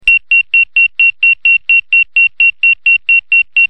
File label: Alarm, alarms